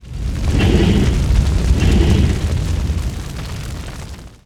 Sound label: fire hot